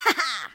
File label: jump; Talking Tom Gold Run; Hank